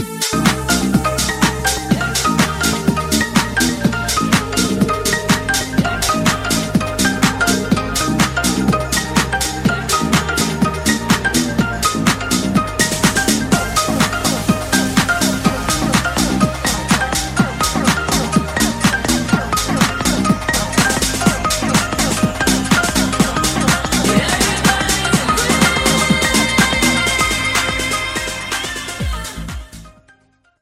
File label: Infinix Ringtone; Infinix ZeroX